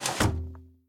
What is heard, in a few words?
Door Slide Close Glass; Devour; The Inn